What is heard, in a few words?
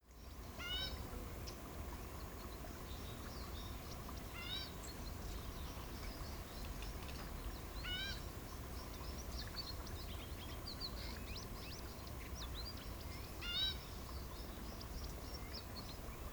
african bush midday birds insects idle